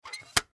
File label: Cd Jewel Case Close, Cd Case Close Dvd; Plastic; Materials; Blastwave; Foley